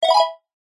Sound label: multimedia game sound synth mallet style short generic action tone